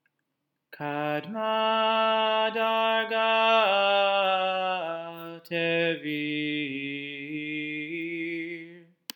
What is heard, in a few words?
Torah Trop Pattern